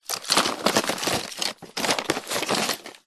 household tub small containing odds and ends rummage; zapsplat